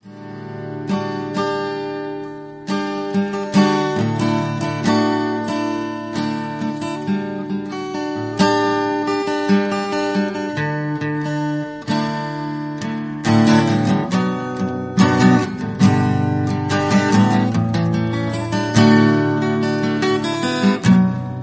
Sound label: Acoustic Guitar Ringtone